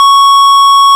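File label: ORG, Farfisa organ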